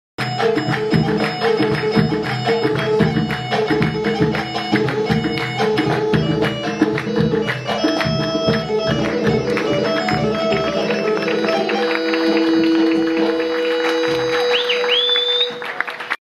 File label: Lianic, ساز